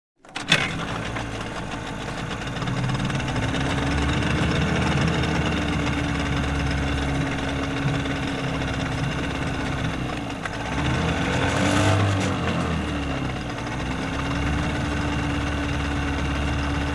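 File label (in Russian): моторная лодка маневрирует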